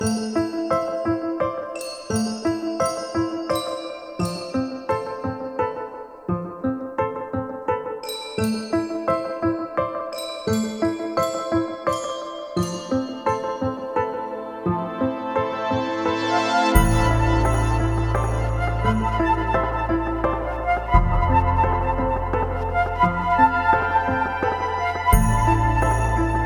Christmas Dungeon, Loop

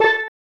Tadpole Melody (La); Super Mario RPG; SNES

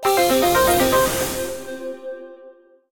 Sonic Loop

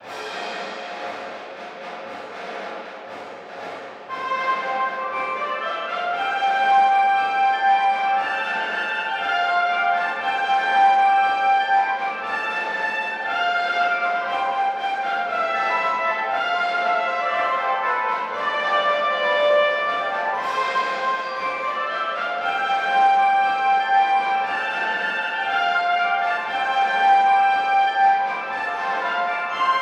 Hymn

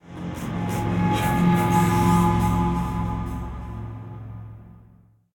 defeat sting; AirMech Command VR

